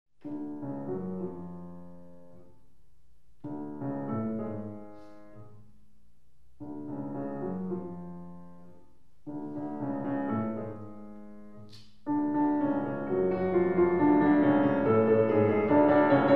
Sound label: Chopin Piano Recital Polonaise op.44 Pianist live